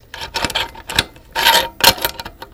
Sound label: Handle Bars Remove, scrapes